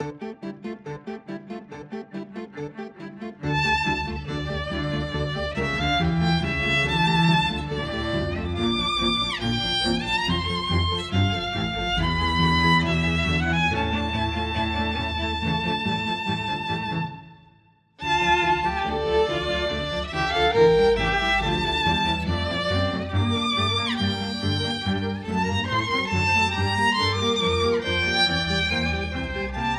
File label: The Crowded Divorce, Classical Cinematic, Witty